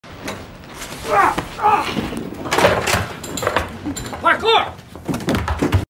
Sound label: parkour sound